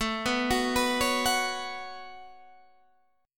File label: B 7th Suspended 2nd, chord